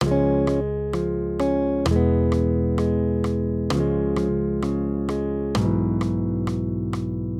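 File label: Guitar, Accompaniment, Major Triads, Db-E, 130 BPM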